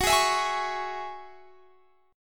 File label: Gb 7th Suspended 2nd Gb Guitar Standard, Gb7sus2 Chord; strummed; JGuitar